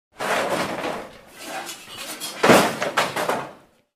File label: trash can